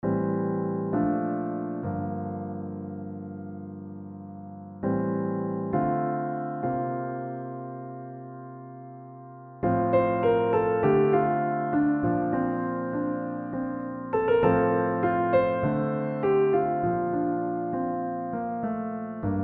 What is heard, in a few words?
Piano Solo